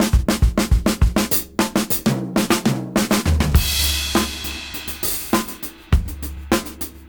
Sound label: Tricky 101 BPM